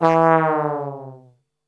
E 1X TRBL OF, Brass; Best Service; Brass Super Section; 90 s Sample CDs

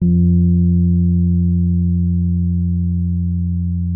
RHODES MK1P F2; Xsample VOL-1

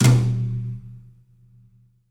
Brush Flam Tom, Roland BK-5; Toms

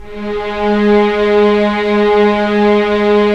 STR SC, Roland LA Composer; Roland - LA Composer vol. 4; Full Sec

